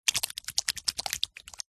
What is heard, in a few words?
human hands wet rub together